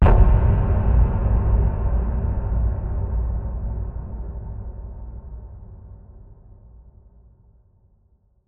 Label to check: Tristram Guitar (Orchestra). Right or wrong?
Wrong